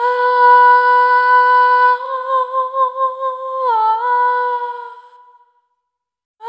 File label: Single Voice Melodic Loops, Vocal Samples